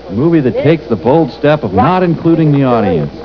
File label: audience; MST3K